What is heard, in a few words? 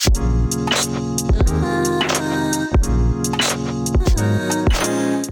kit groove 90 bpm; MIDI Clips; Keys 2 Love Kit groove; Ableton; Factory Packs; Beat Tools